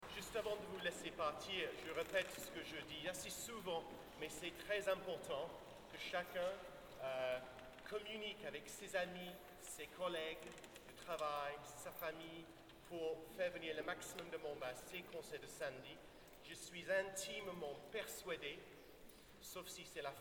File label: Afterword and applause; Five Mystical Songs Paris, 28th April 2007